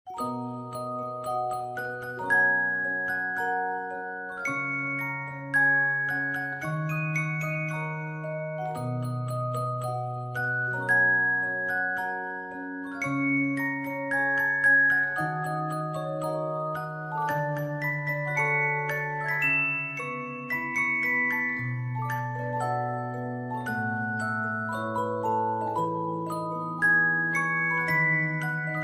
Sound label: music box ver.